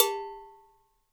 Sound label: COW; Sampleheads; NYC Percussionworks; 90 s Sample CDs